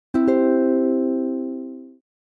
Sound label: Cute Message; ios